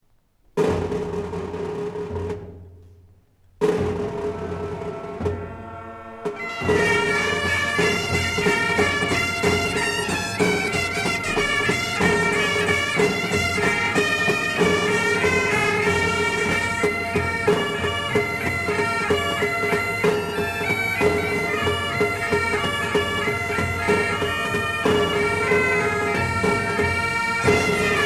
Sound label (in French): Les gavottes pourlettes